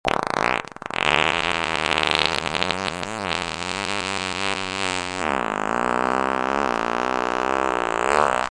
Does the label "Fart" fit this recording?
Yes